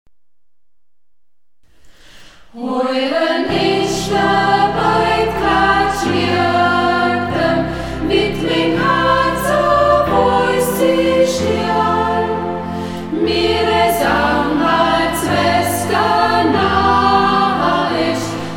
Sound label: chor, Ortsmundart; Hiren ich de Biëtklok (De Biëtklok); Burgberger Chor; Hegt wird gesangen